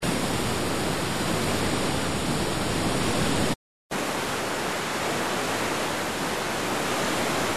cascata acqua; Torrente; Versi Animali; Il Forum della Fotografia Naturalistica

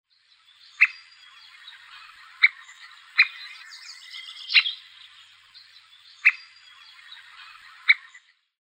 Picchio Tridattilo Picoides tridactylus; Versi Animali; Il Forum della Fotografia Naturalistica

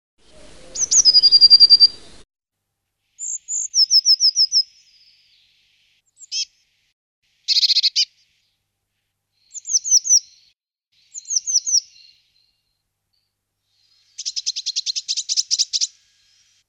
Cinciarella Parus caeruleus; Versi Animali; Il Forum della Fotografia Naturalistica

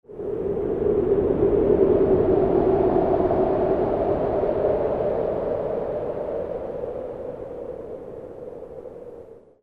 Vento, Bufera; Versi Animali; Il Forum della Fotografia Naturalistica

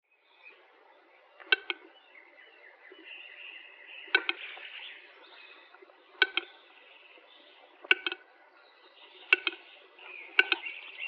Gallo Cedrone Urogallo Tetrao urogallus; Versi Animali; Il Forum della Fotografia Naturalistica

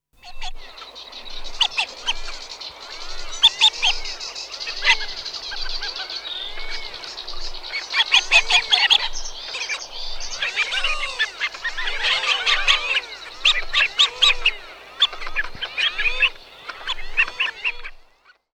Moriglione Aythya ferina; Versi Animali; Il Forum della Fotografia Naturalistica

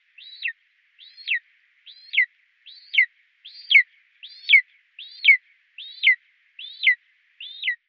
Aquila Aquila chrysaetos; Aquila Reale; Versi Animali; Il Forum della Fotografia Naturalistica

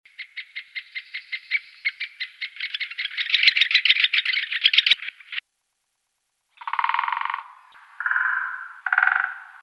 Picchio rosso maggiore Dendrocopos major; Versi Animali; Il Forum della Fotografia Naturalistica

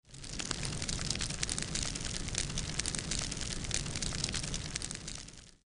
fuoco; Versi Animali; Il Forum della Fotografia Naturalistica

